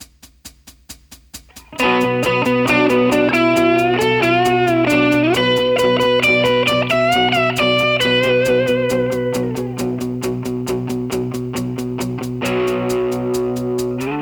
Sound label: Solo